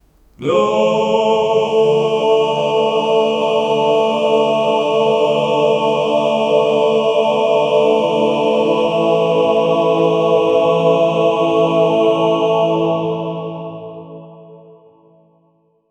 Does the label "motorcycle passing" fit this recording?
No